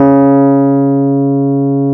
E PNO C#2; Synclavier Sampler Library

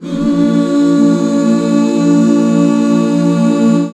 Bb 6, back chords; Sound & Vision - Gigapack II CD 1

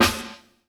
HIT VERB, PROC SNRS, Drumworks; Sampleheads; New York City Drumworks VOL-1

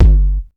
Kick, dry kicks; Quasimidi Rave-o-lution 309

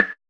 perc, Roland MC-909

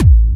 hardbassdrumz, breakcore; Bazzdrumz

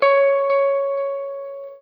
NOODLE LONG, Guitar & Bass

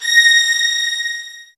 SOFT VIOLINS

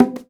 PRC, Bongos; Spectrasonics; Supreme Beats; World Dance

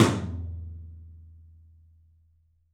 13 TOM, 3D Drums; 3D Sound

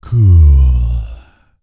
Vocal Planet R&B Dance BASS CATCH; Spectrasonics Vocal Planet CD4

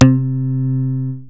bass, synths

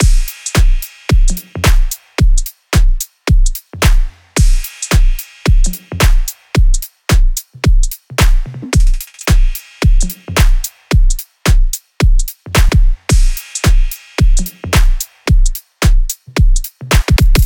loop full 116; Seismic Loops

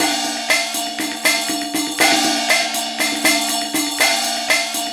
MIX, PERCS 120BPM; INIS - Opium

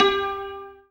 zimbal G 3; ZIMBALON

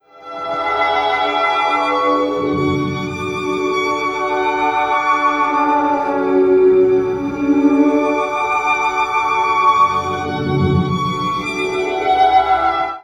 STR, STRINGS; USB Soundscan vol.03; AKAI

